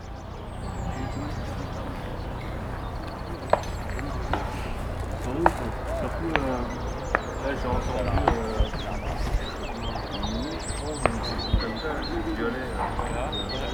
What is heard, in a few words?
explosions; quotidien sonor